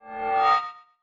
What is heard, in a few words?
Hammer; Fallen Paladins Hammer